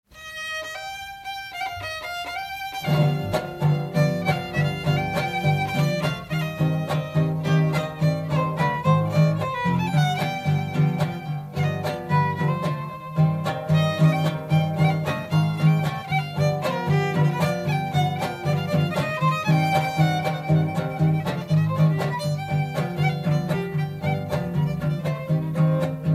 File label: mexico violin